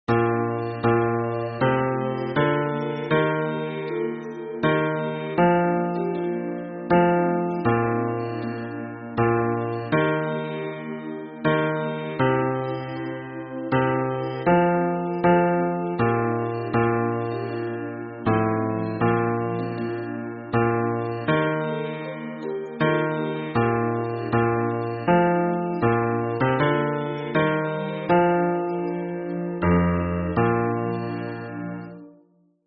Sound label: hymn bass